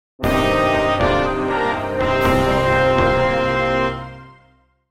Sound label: jingle